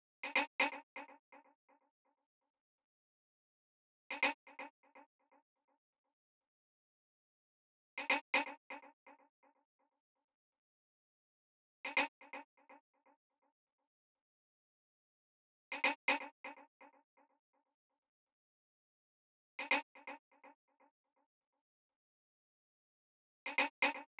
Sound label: Vocal Hit Wet Cmin 124bpm